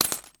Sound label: coins bag